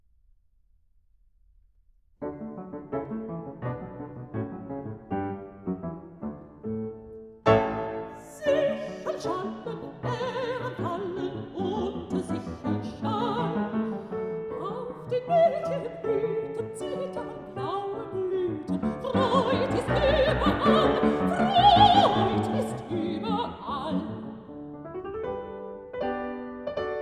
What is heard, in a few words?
song for voice & piano; Erntelied; Schubert